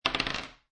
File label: Dice Throw One Die On Wood Table